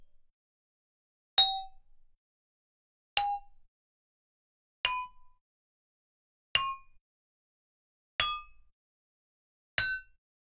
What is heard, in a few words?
Gamelan; Propeller Island - Complete Gamelan